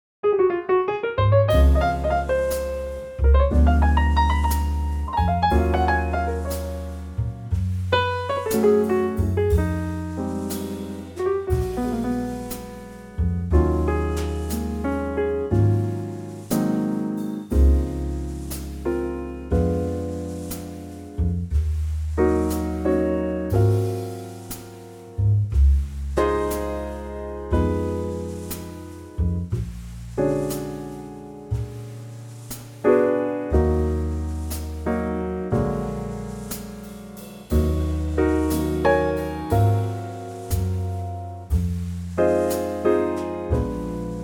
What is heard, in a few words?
Db trio